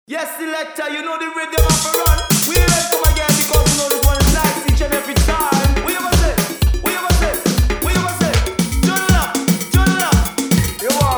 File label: SOCA DRUM LOOPS